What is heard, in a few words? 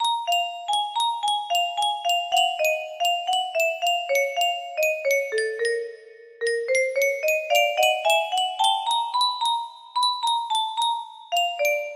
mystery melody